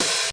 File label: c64 sid, Commodore 64 8bit SID Drums; LO FI and 8 BIT KITS